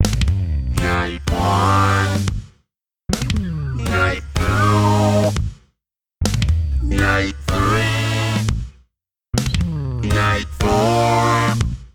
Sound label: Night Bumpers; Monster Seeking Monster; Jackbox Games; The Jackbox Party Pack 4